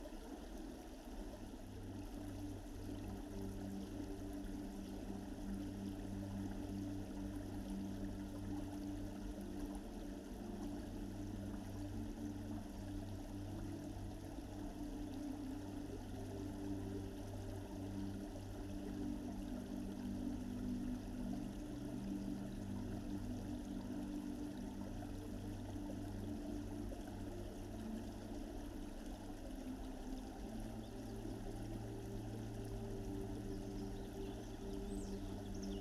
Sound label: Binaural Beats Brain Waves Isochronic Tones